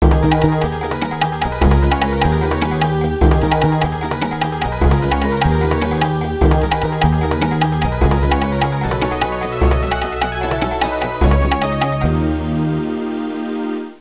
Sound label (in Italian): musica traditional est; fareast